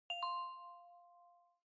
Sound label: Smartphone UI Sound Speaker